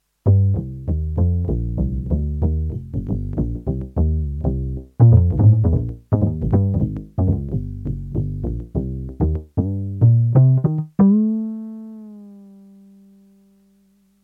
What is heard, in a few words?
DX7IIFD String Bass